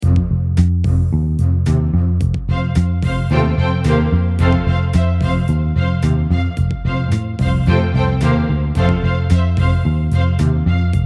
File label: Rain, Rain Go Away No Melody; ukulele; Rainbow Ukulele Play-Along